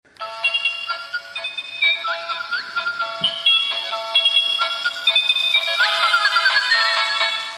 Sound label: Bicycle